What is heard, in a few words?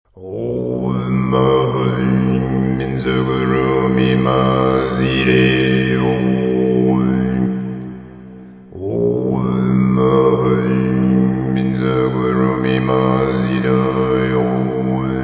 Om Ah Hum Vajra Guru Padma Siddhi Hum, Tibet Chants Mantras; 8 Mantras